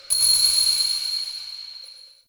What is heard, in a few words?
Interior Orbital Station Ring; Warframe; Tau